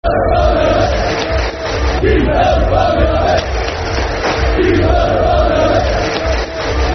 Football Chant (Soccer Song); Keith Hill's Barmy Army; Rochdale Rfc

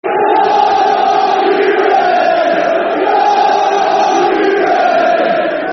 Come On You Reds Football Chant; Nottingham Forest Nffc

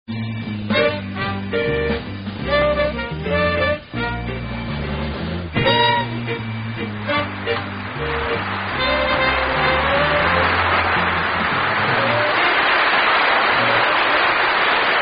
Instrumental Introduction; summer session

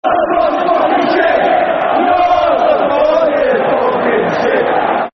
Your Support, Football Chants; Wolves; wolverhampton wanderers; fanchants

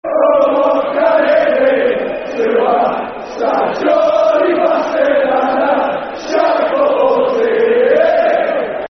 Cheer Up Kevin Keegan, Football Chants; Stoke City; Stoke City Scfc

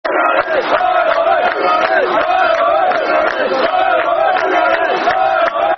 Barmy Army, Football Chant (Soccer Song); Gen Roeder's Barmy Army; Newcastle United Nufc

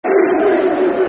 Cheat Football Chant; Crewe Alexandra Cafc

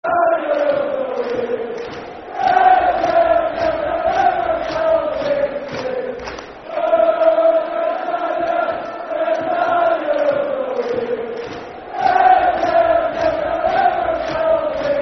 Football Chant (Soccer Song) N. Ireland; Rule Britannia; N. Ireland N.i.f.a

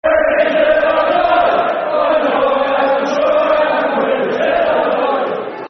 Football Chants (Soccer Songs); Reading Till I Die; Reading Rfc

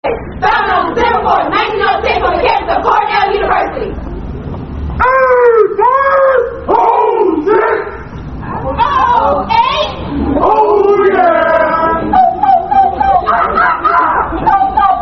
Kappa Alpha Psi Greeting; Alpha Kappa Alpha Greeting For Kappa Alpha Psi

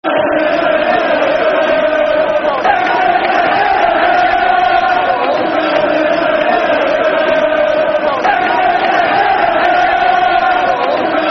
Football Chants (Soccer Songs), Liverpool

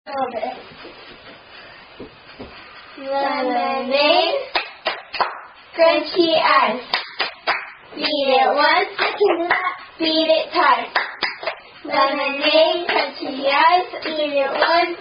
Hand-Clapping Rhyme Game; Lemonade Crunchy Ice Game

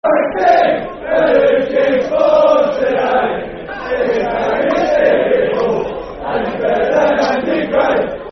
Football Chants (Soccer Song); Stevie Is King; Wolves; wolverhampton wanderers; fanchants

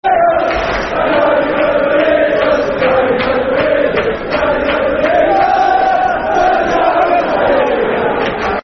Football Chant, Cheltenham Town; Soccer Songs; The Town Are Staying Up; Cheltenham Town Ctfc

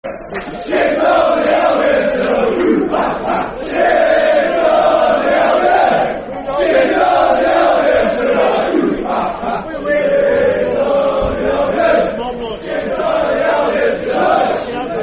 S On The Albion, Football Chants; Wolves; wolverhampton wanderers; fanchants

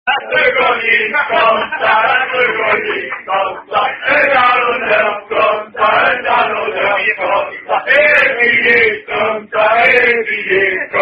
Chanson French Rugby Song

